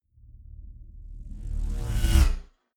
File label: sci-fi vehicle drive by buzz; raumschiff